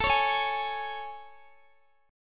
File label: A Major 7th A, Guitar, DADGAD